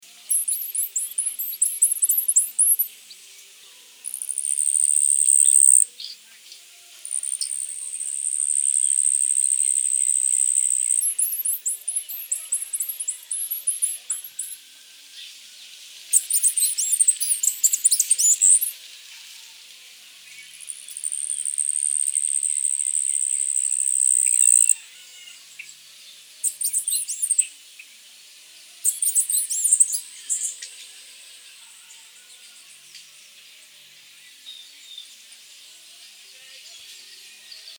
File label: Bee hummingbird; مرغ مگس زنبوری